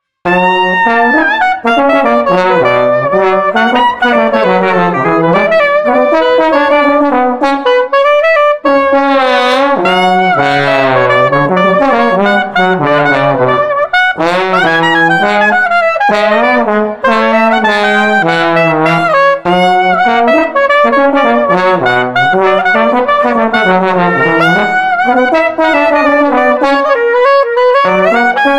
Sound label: Street Clarinet Trombone Upbeat 100